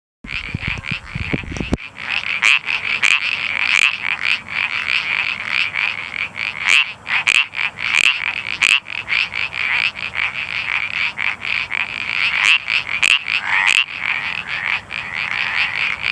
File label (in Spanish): sapillo moteado